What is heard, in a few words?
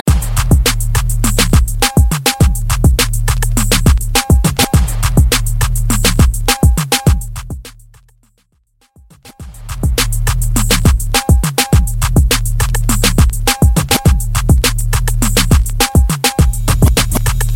Top40 Pop Mixshow Re Drum 103 Bpm